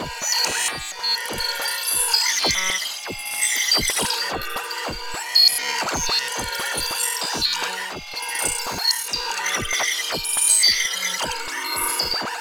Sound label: Comp Glitch Loop; Warframe